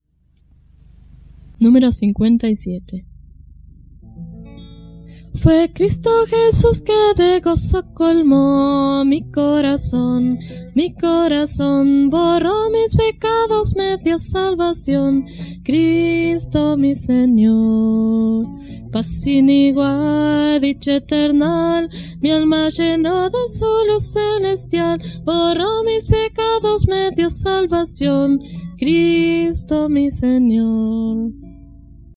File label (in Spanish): Coro, himnario niños